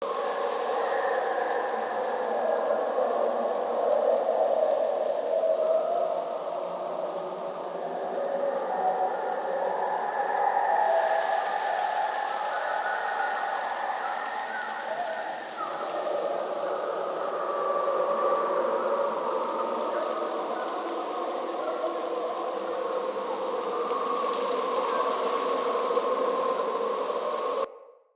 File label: Day Amb Loop, Ambience, Glades; Glades Of Andurin